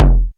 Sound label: FX kick; E-MU Formula 4000 Series Vol. 2; OrbitPercMaster